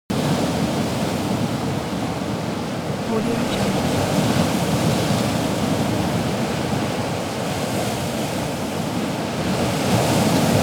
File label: atmo sea waves stormy; امواج طوفانی دریا